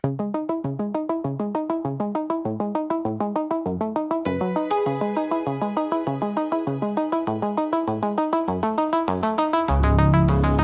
dark synthwave for podcast glitchy sfx low fidelity